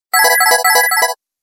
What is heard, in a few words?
Serene tone; Samsung; SGH-F258